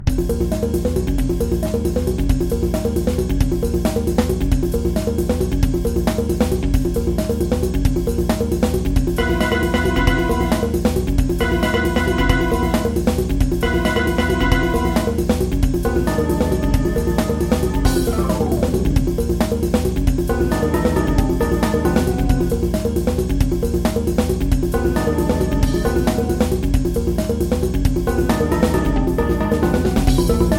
percussive warm

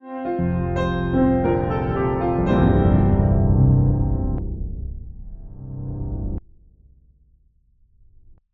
grand piano keys

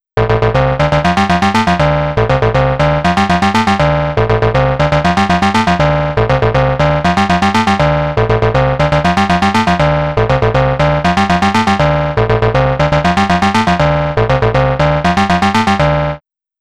ring modulator

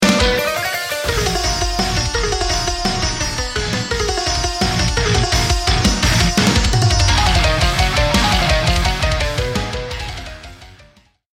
synths sitar; shokran synths pack

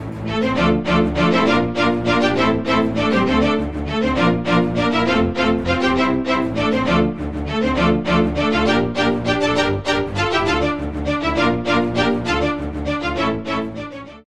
spiccato strings

